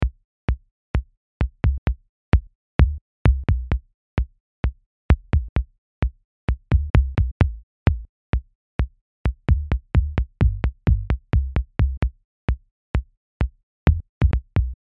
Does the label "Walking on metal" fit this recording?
No